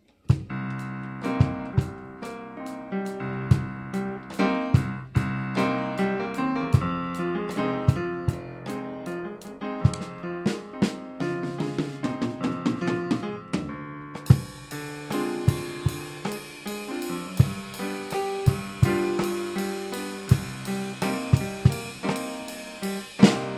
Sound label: Bossa